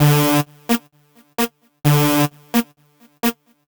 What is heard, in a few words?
Synths, Underground UK House & Electro